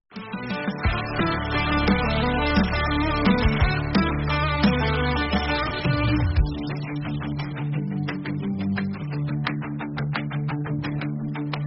instrument; warung pandoo

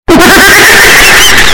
goofy laugh earrape

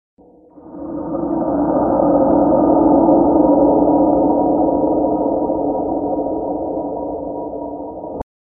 ethereal space

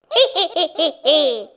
hehe; tattletail

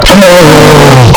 goofy ahh yellin

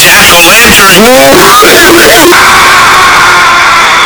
woah ahahahahahaha; jack o lantern

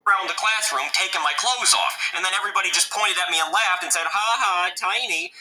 haha tiny

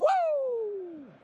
woo; esporte interativo

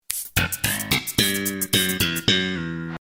sitcom transition bass riff; seinfeld

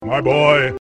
buzzer

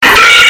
piggg

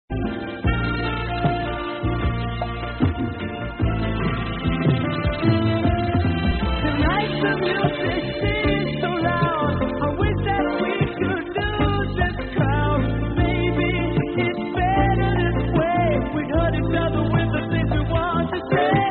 music

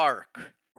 bark

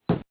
flashbulb

